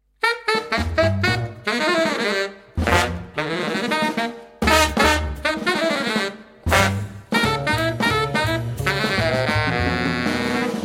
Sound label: Blues